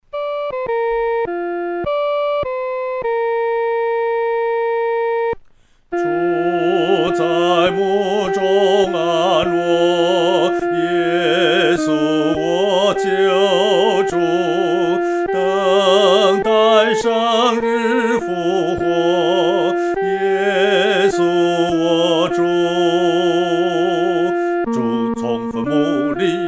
独唱 第一声; 基督已复活

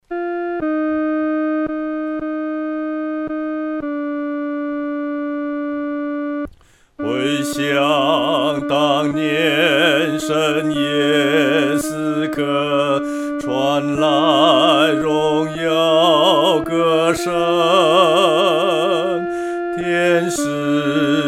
独唱 第二声

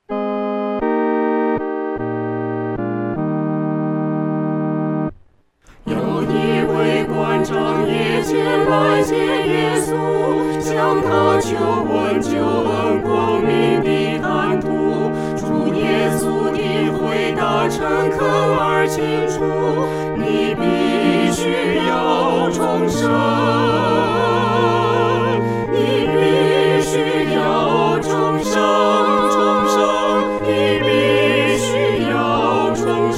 合唱 四声部; 你必须要重生

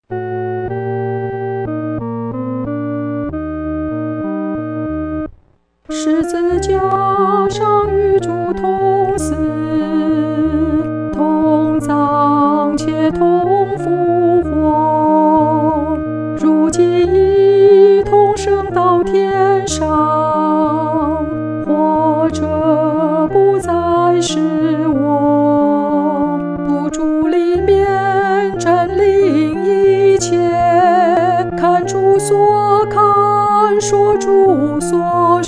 独唱（女第二声）

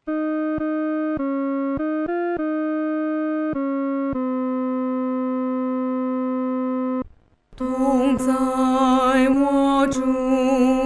独唱（第二声）

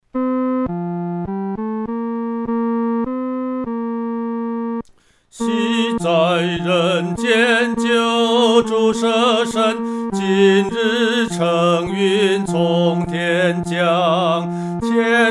独唱 第三声

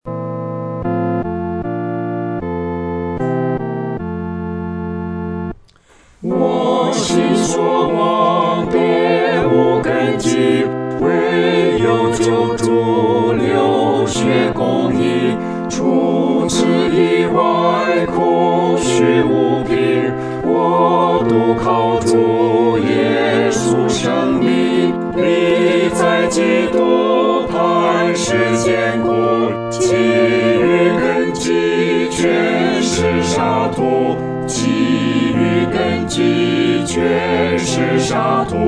合唱 四声部; 坚固磐石

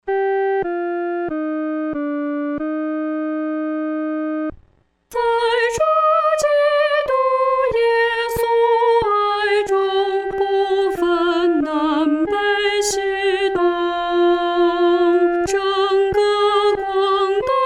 独唱（第一声）

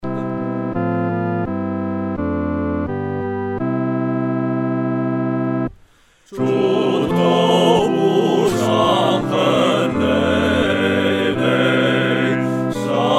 合唱 四声部; 至圣之首受创伤

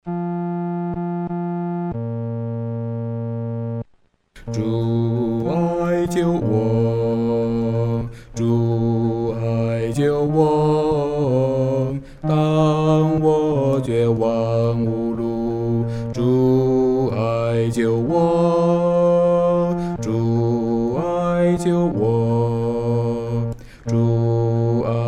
独唱 第四声

